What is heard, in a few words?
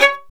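violin, marcato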